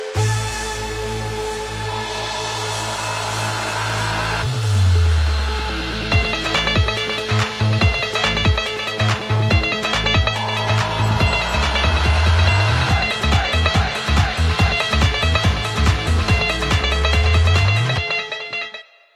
Punjabi Beat Ringtone

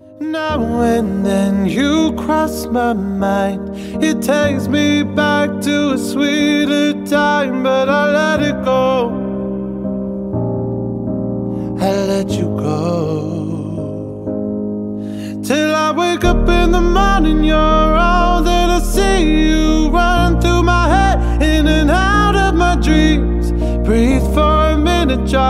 Sad English Ringtone

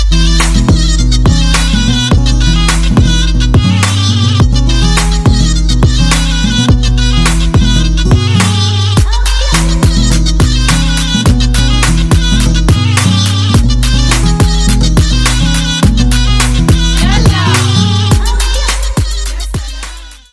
Infinix Zero 8 Ringtone

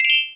Ring Notification Ringtone

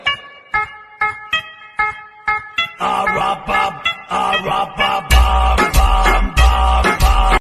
DJ Ringtone; Nokia

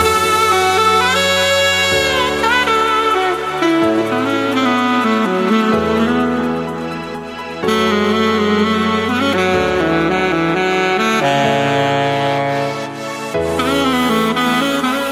Ringtone For Huawei Mate 20 Pro

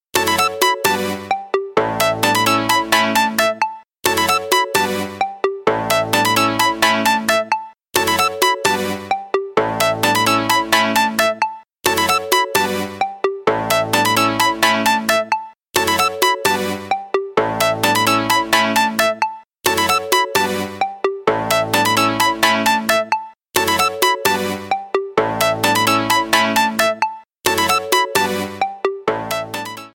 Love Ringing - Tone

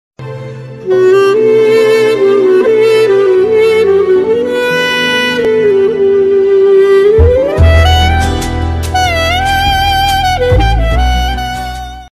Sad Violin Ringtone

